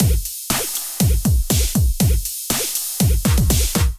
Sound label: Riot Kit, Drums; Ableton; Build and Drop